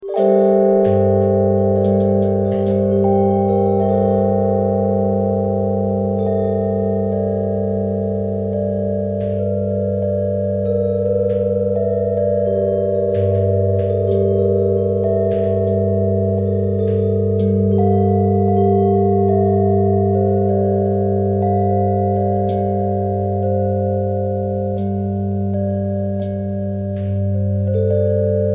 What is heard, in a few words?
bell twist